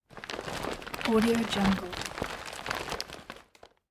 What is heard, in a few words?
thick reusable shopping bag rustling